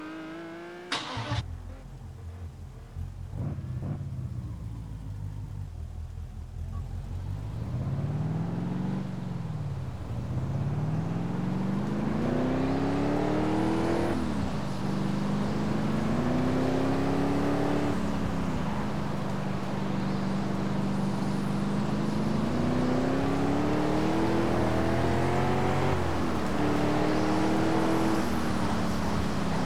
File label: mercedes-benz e 420 w210 avantgarde; TDU